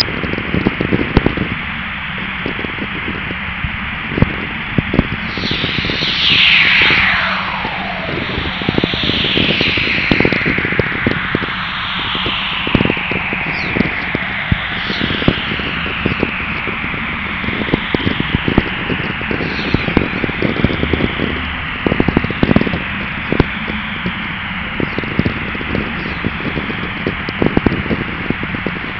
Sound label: hiss